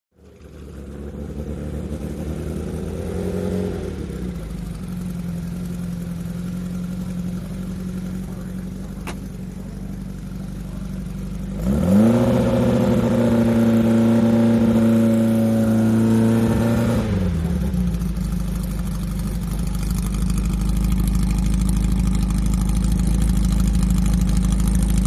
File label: Vintage Race Car; Racing Ambience, Vintage Race Cars Cu Cars Idling, Pa In The Background, Shot Cu From The Track; 1954-1962 Vintage Race Car